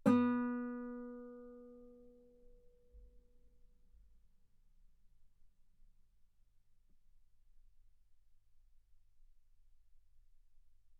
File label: Guitar B3; ff; sul B